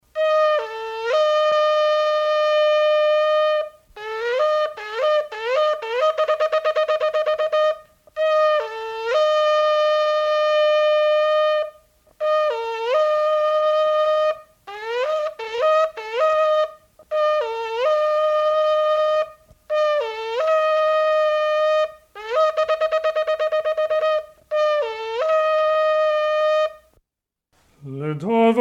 Jewish Shofar And Liturigical C

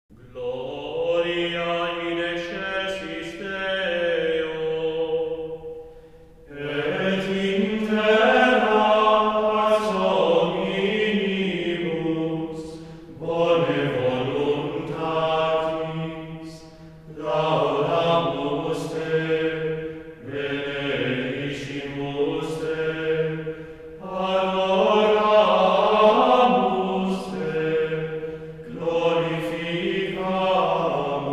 Gregorian chant Mass, Gloria; Mass for Christmas Day

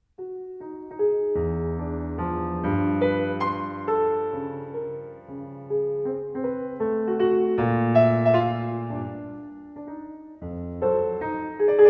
Piano Suite Minuet and Trio; Arnold Schoenberg; Op25

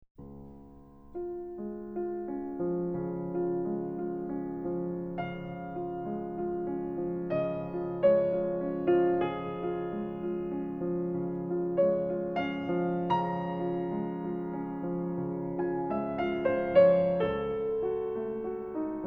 Nocturne in D-flat Major; Fryderyk Chopin; Op27No2

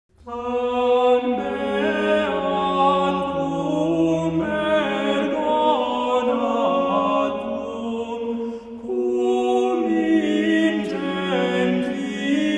Aquitanian organum; Jubilemus, exultemus

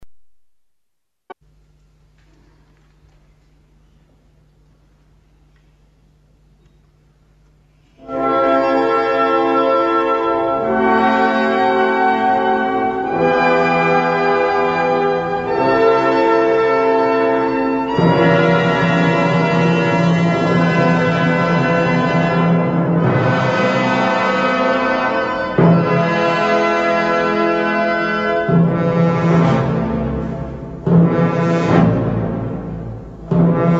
Symphony No4 in E Minor finale; Johannes Brahms

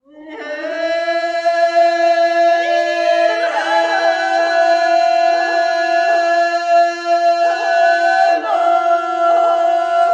Bulgarian Women's Chorus

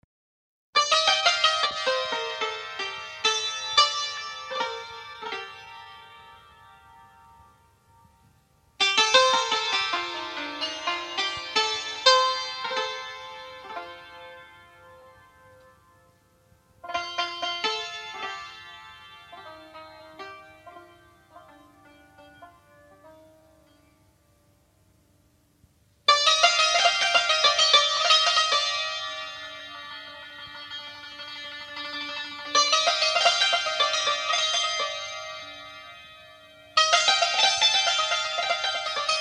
Dastgah For Santur And Voice

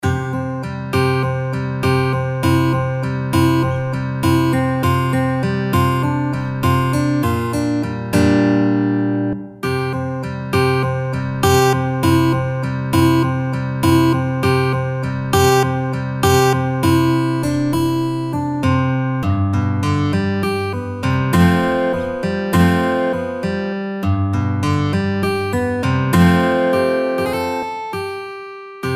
Acoustic Guitar; Jesus Jesustrans

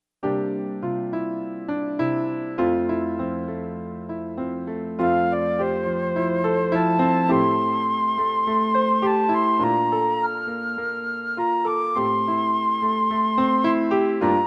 Fickle Love (F, high) piano acc.

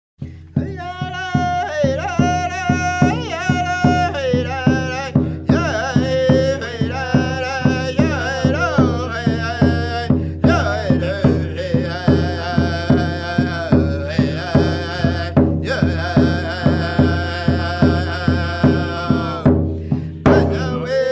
Plains Indian song

